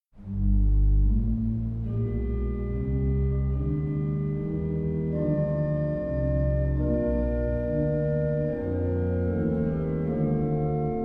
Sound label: Chorale Preludes; JS Bach; Nun komm der Heiden Heiland BWV 659